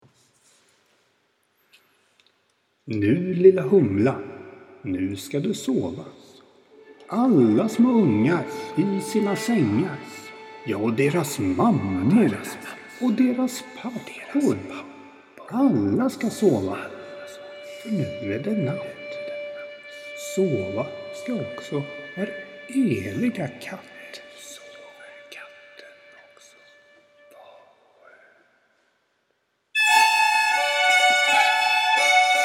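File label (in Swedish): Alla ska sova för nu är det natt, säckpipa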